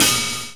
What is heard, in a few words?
OH RUST, HHT; E-Lab; X Static Goldmine 2; akai